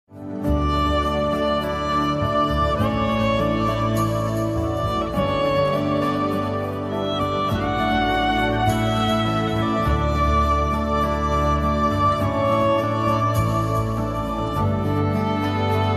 piano

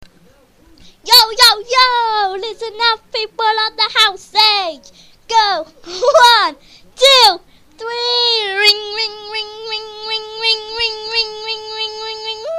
Phone Ringing

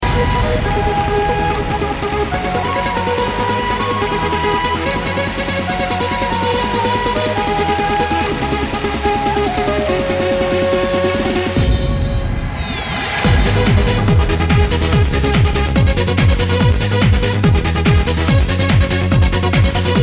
trance; quake

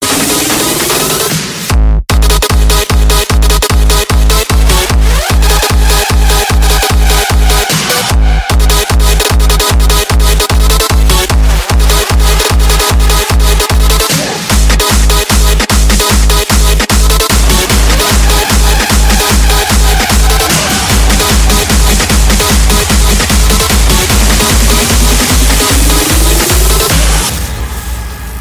Chord Splitter

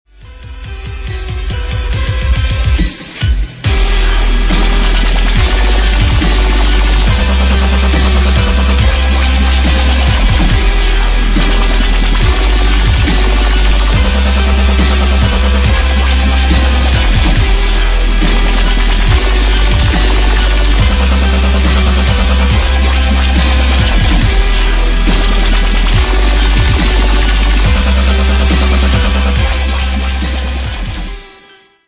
dubstep remix

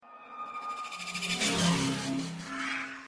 shuttle

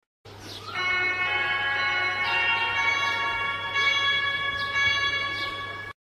Romania CFR Jingle

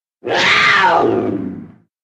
Puma Roar

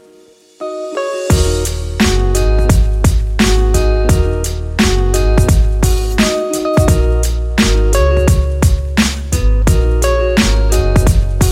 Hip Hop

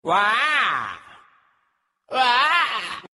Bass Wahh Wahh

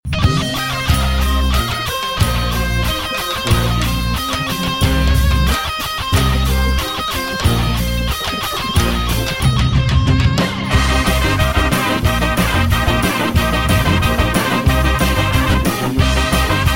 trubaci; ringtones

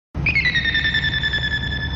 Incoming; gundam

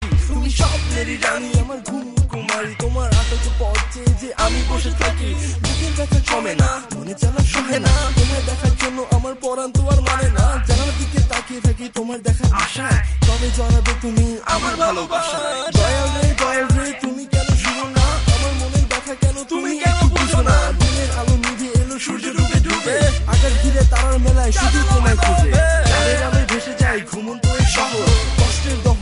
Rap

